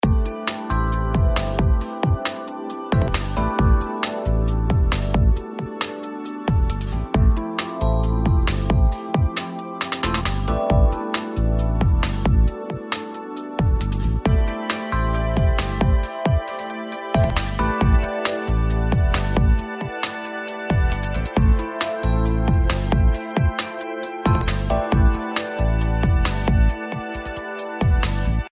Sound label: Smooth Loop; the prometheus secret noohra